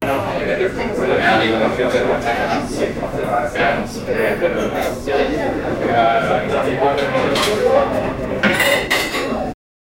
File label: the inside of a austrian, restaurant